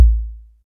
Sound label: bd kick